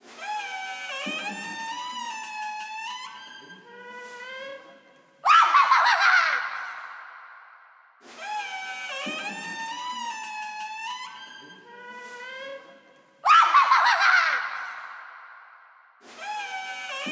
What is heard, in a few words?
Door Laugh